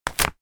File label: leisure game twister game sheet barefoot lift up; zapsplat